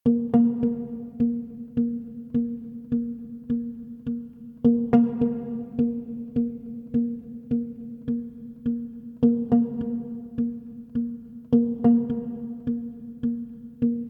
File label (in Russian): Rumba (zvukami gitary)